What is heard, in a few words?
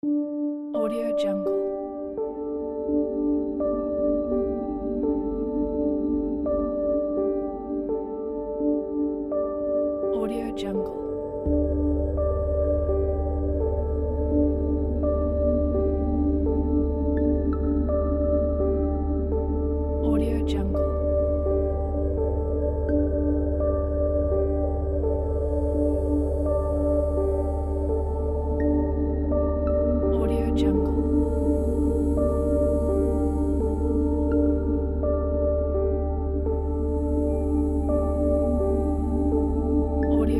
ambient reflection